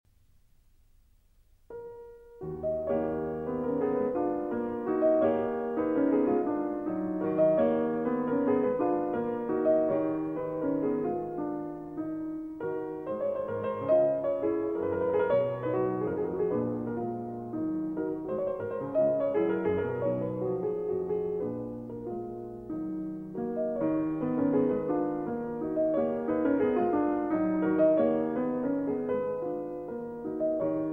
Mazurka In E Flat Minor

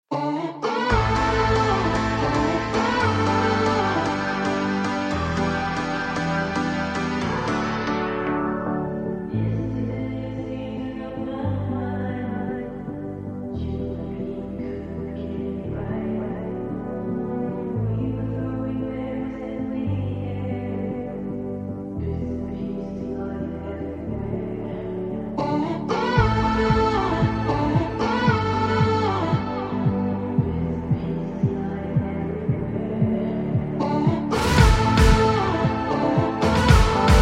Karaoke Version

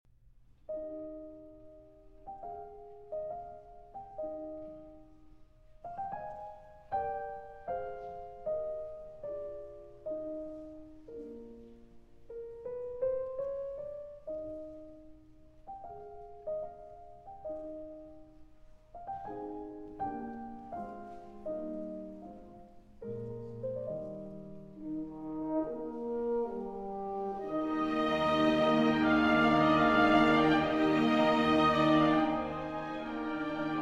Piano Concerto in B flat, Larghetto

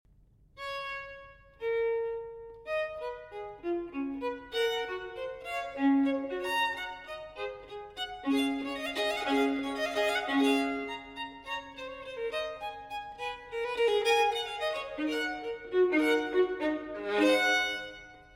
Solo Violin in G Major Allegro; TWV 4015; Telemann Fantasia

